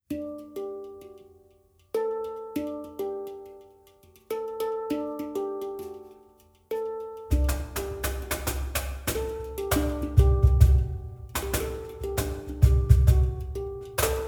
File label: hang cajon; citypercussion